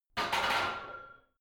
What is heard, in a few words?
barbell heavy plates replace rack reverby hard; sport freizeit; fitnessstudio